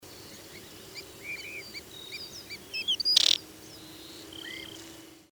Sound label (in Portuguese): canto do papa moscas canela